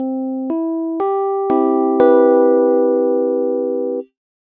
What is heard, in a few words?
C Major Just Intonation